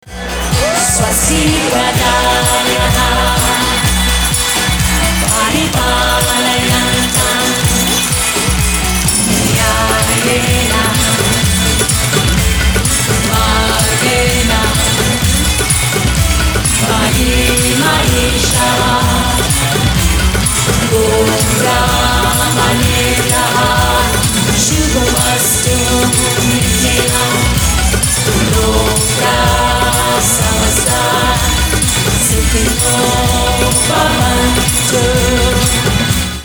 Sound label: ringtone